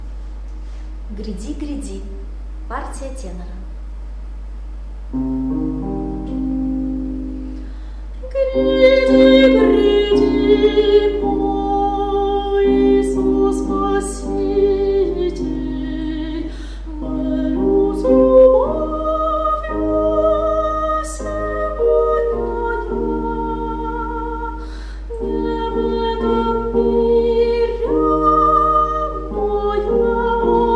gryadi gryadi tenor; epiphany 2017

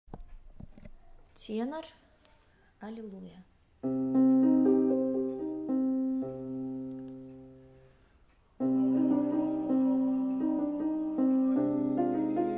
Tenor, Allilujja

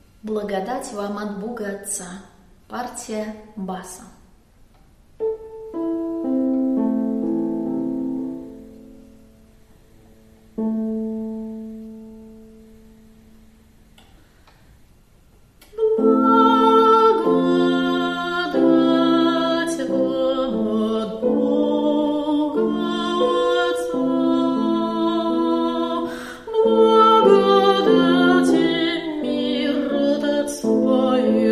blagodat vam ot boga otca bas

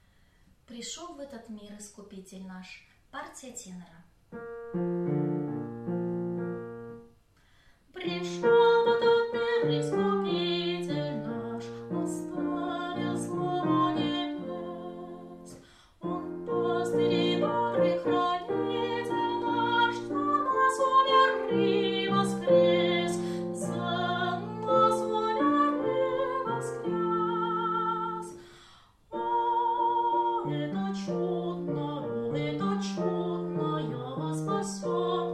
prishyol v ehtot mir iskupitel tenor; epiphany 2016

